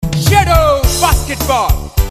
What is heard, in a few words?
basketball; shadow